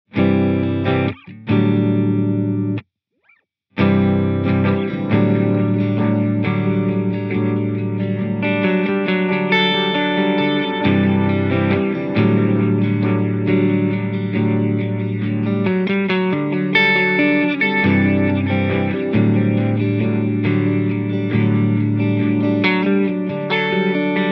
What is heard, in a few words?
Tape